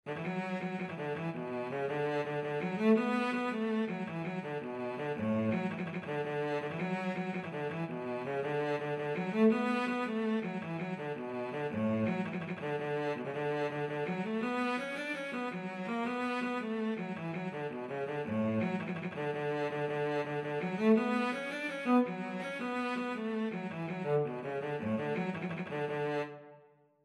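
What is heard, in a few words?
violoncello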